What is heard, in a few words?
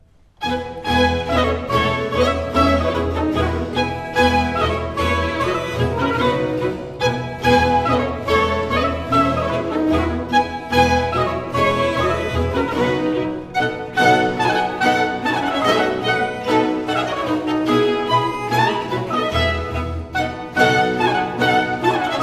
Tambourins Suite; Tambourins 1 2; Les Fetes D'Hebe; Jean-Philippe Rameau